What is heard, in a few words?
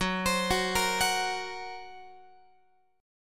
Gb Major Flat 5th, Guitar